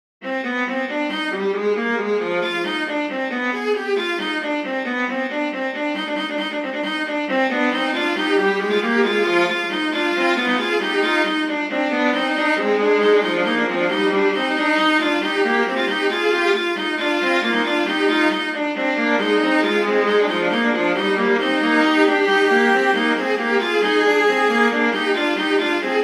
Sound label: invention c minor for viola duet; Johann Sebastian Bach